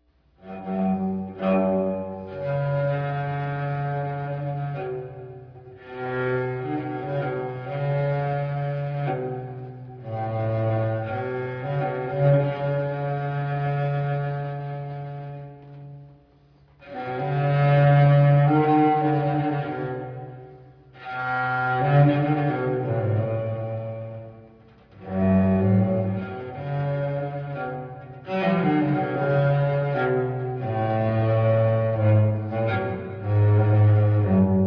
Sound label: sunrhythms